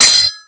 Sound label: SW HIT, MORESWORD; Total Control - Action Cutz